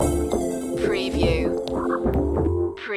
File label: retro video game sweet action stinger